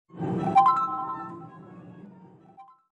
iphone; iphone 12; apple iphone

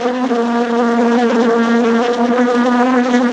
bee sound

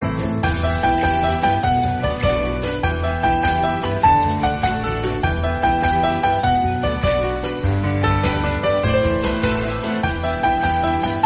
bgm tamil; thiruchitrambalam

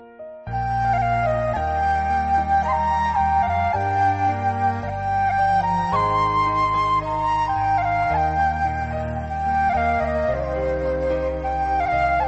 chinese flute

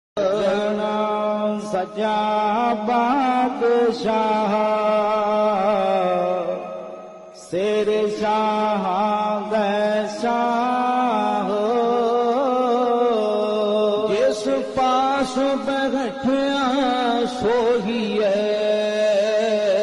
gurbani kirtan punjabi

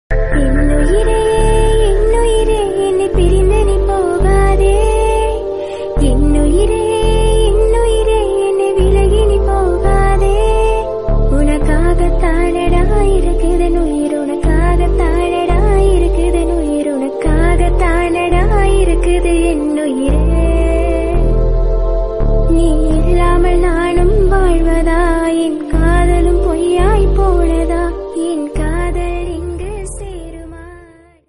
female version tamil

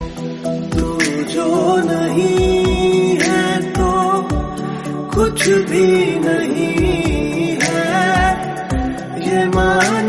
hindi

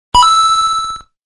mario coin sound notification

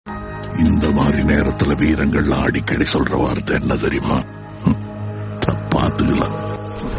bgm; vikram 3; kamal haasan

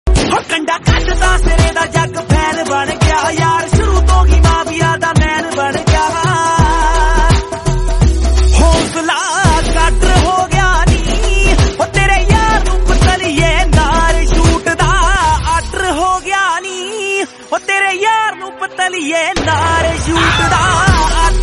song remix

